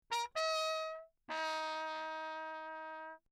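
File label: trumpet mute signal; Lechner; Ullven; Perinétventiltrompete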